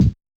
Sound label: BD Jungle, Yamaha DJX 2B